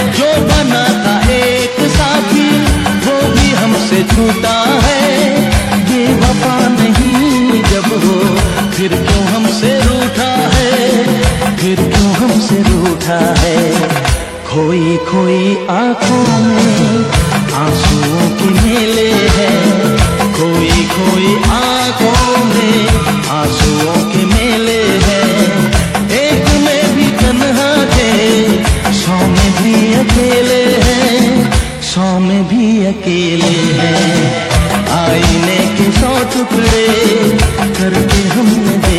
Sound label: Sad Old Song Ringtone